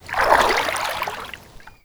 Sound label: Water Lapping Gentle Small; Ambience; Warframe